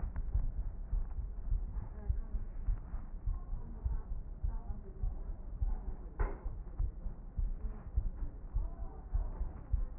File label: chest